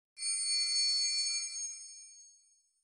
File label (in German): Instr Triangel; unmittelbar aufschlag; Instrumentenkunde